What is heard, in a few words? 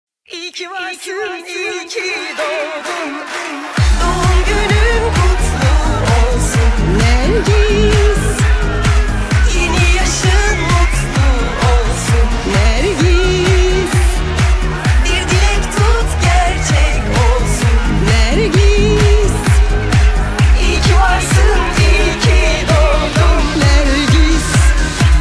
remix